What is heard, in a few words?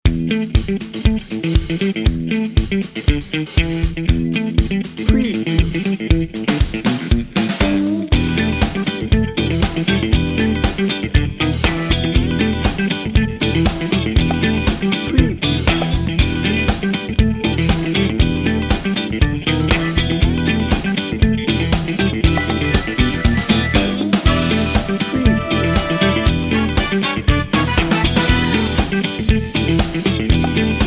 funky toes no solo